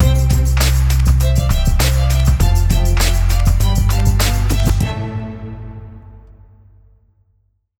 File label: 100bpm light staccato cello ENDING